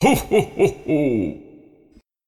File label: ohoho; santalicious